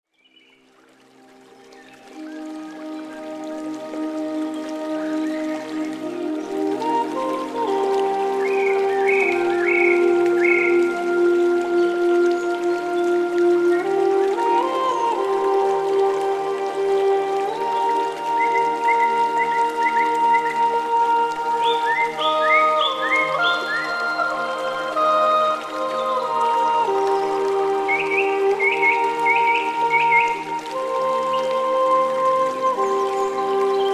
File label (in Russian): rasslablyayuschie zvuki lesa